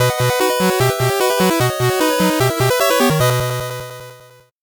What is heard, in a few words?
8bit jingle normal